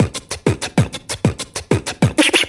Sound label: Beatbox; Drum loops